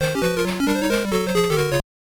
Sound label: Jingle; fred couples golf 1994 game gear